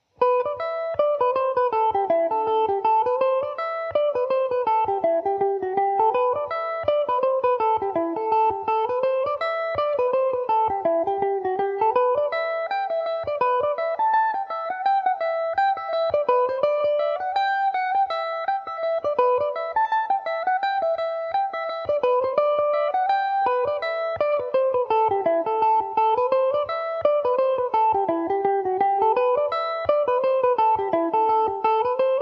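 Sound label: G major mandobird, irish mandolin; dan breens reel version 2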